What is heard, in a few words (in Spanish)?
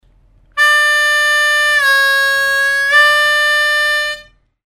bend asp, armonica